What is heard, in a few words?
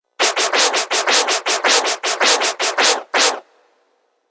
Rhythmic record scratch sound effect.; SFX DJ Record Scratch 1; Musical